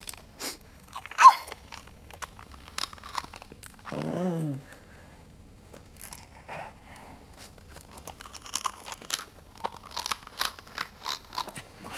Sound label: close up wolf chewing bones and whining; animals